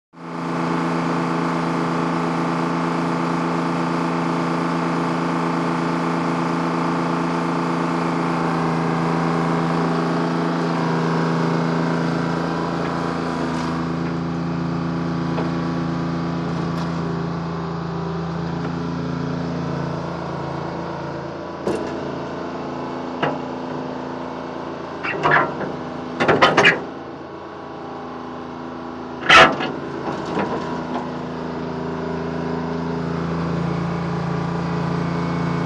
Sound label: Excavator; Excavator ( Jcb ) At Work. Fast Engine Idle With Occasional Clanks And Bangs, Finally Switches Off; earthmover